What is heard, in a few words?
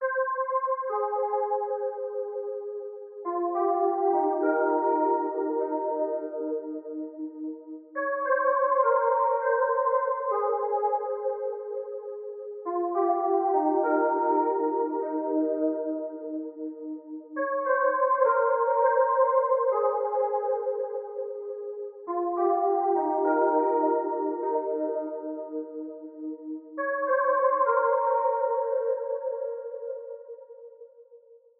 102 BPM F Min Keys; Cymatics; 2022 Melody Mystery Expansion; Tropicana